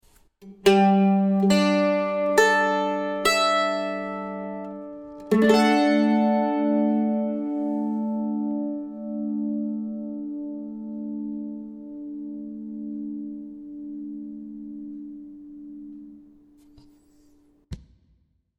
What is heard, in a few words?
mandolin d chord